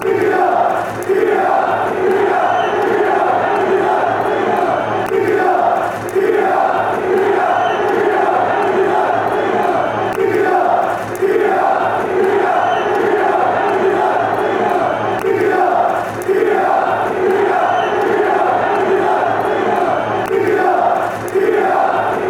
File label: milan milan, fanchants